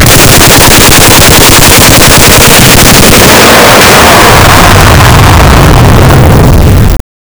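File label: single shot 155mm howitzer; artillery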